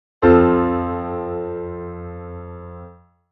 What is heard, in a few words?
F chord